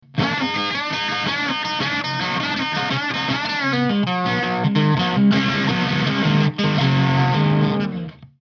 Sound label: Ch2 High Gain, guitar amp